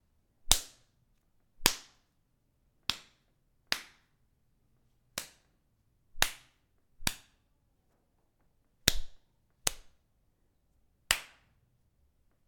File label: Smacks